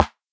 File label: Clap, Dubstep One-Shot Drums; Prime Loops; Dubstep Illusions